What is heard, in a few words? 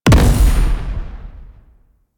Grenade Explosion B; Warframe; Corpus; Jackal Redux; Weapons